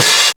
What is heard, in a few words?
Open Hihat; Vengeance Samples; VEE Cymbals